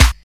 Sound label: clean hand clap a sharp key, Loudest frequency: 1552Hz; single hits one shots